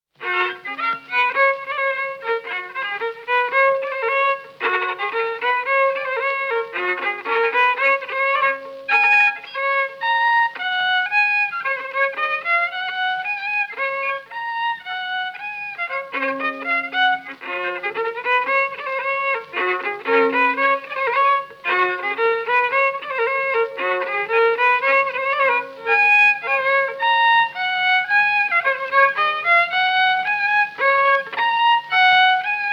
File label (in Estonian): Kuradipolka, viiul folk; Kuusalu; Gustav Linstrom